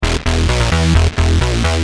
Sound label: Bass, Industrial